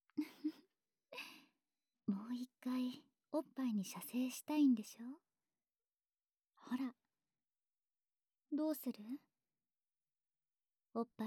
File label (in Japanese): 耳舐めとオナホこき; SEあり